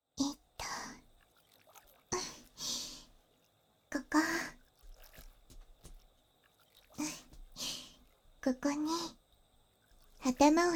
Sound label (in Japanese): 森の中で水の音を聴きながらヒーリング耳かき, ASMR